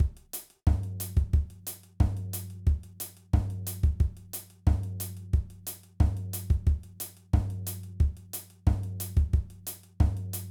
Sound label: Reggae Beat, drum